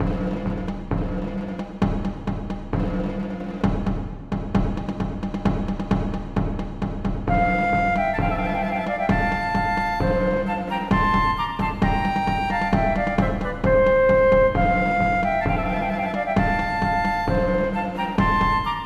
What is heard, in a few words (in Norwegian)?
Marchs for fløyter og trommer; Bang; Marchs de Brandenburg